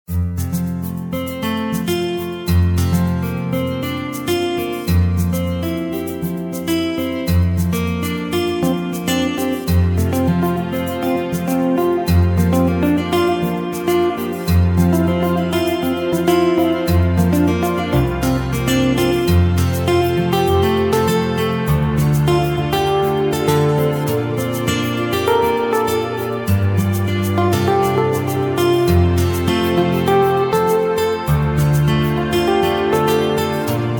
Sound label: sweet guit